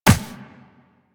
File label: Walk Ice, Footsteps; Warframe; Operator